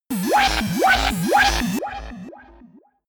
shot futuristic wobble aggressive; digital; soundpool

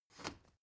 step ladder wood scrape single; schritte

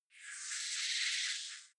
sci-fi hologram message high noise; energie

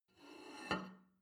plate take table slide, gastronomie; berufe; soundpool

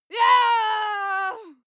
female lunatic scream attack

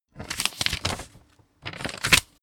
note book paper, Office work; industrie-wirtschaft; bueroarbeit

